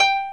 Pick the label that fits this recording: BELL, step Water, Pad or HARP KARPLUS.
HARP KARPLUS